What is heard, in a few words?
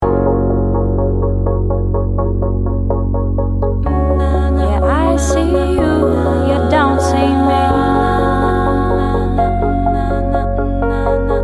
chill pop songs